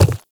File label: Quadruped Medium Walk Default, Footsteps